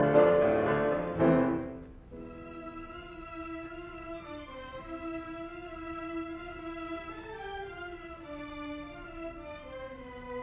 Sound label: Piano Concerto in B flat major Allegro appassionato; Brahms; Op 83; Arturo Toscanini